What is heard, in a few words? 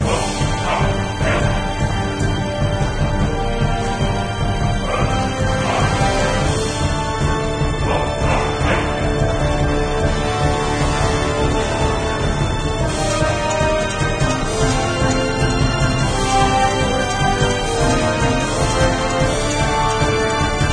Viking Bgm; dragon mania